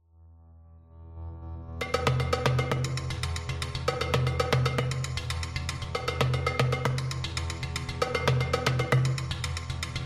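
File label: Historical, battle background music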